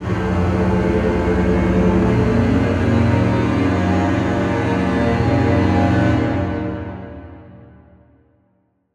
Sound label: Suspense Strings Low, horror strings